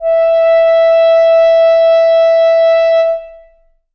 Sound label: reed acoustic, clarinet